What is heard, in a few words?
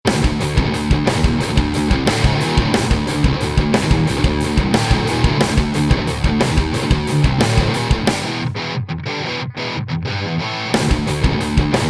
dist riff